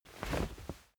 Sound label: camping chair rise fast